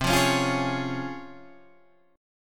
Db Major C, Db/C chord; strummed; JGuitar

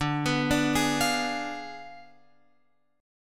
D Diminished 7th D, Guitar, Open F; Ddim7 arpeggiated; x,9,11,9,11,12; JGuitar

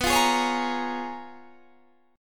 C sharp 13th B

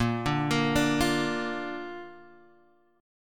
A sharp Major, Bb; chord

